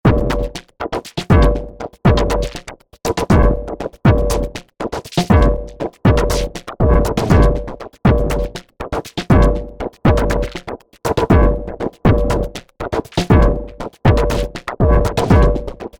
drums grainbrain; grainbrain by xnullx

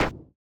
808 trap thunder storm snare; cyber drums 2023; drum kit; nova sound

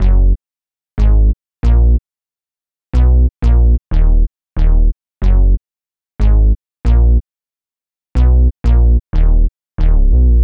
bass; mob; player vst; sound selection kits

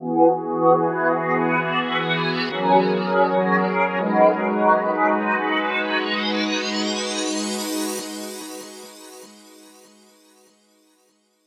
smooth pad processed; kern audio

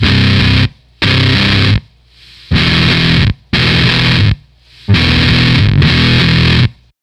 gtr, Fuzz test; frenchtoast

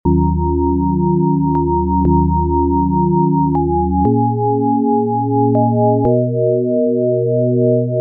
sustained sine dry; chiral audio

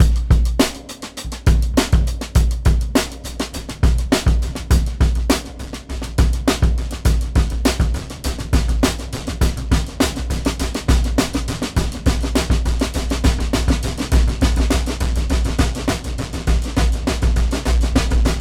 breakbeat feedback, reactive delay; septimbral

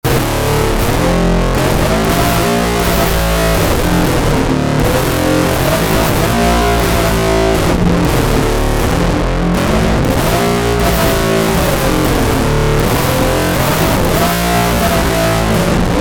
synth foldspace

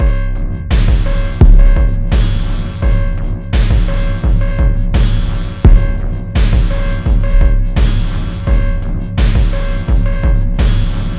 quick ind beat variant

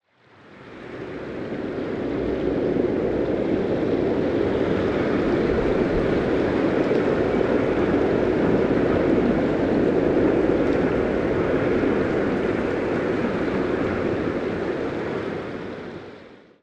deep under the water river pool; nova sound

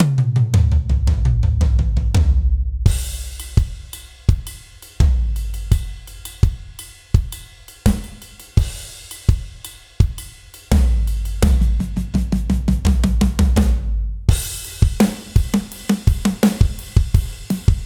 gospel drum only; gospel drum kit ii; polarity audio

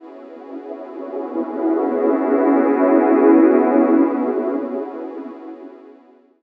aquatic chord; sonicphoto; skytopia

